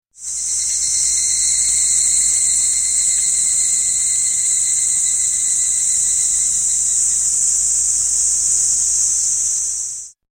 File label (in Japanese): 滝; セミ